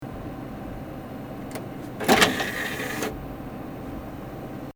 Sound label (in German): PC Laufwerk raus